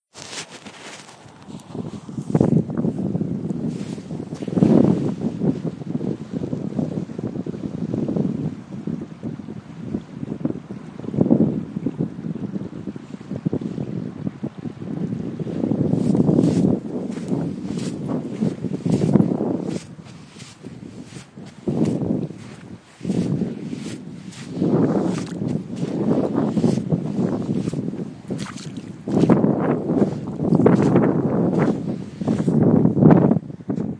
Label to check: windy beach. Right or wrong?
Right